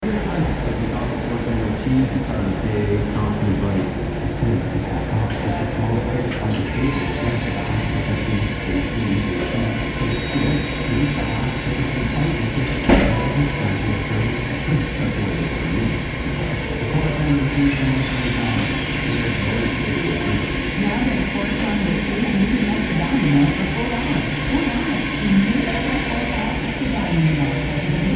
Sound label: Apartment Sounds